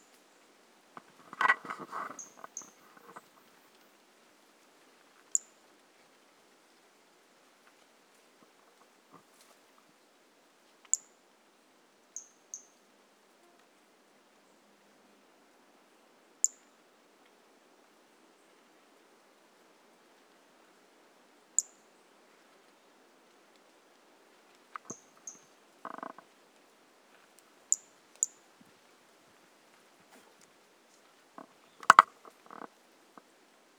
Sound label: Brachycephalus mirissimus individual two initial notes missing; A E Confetti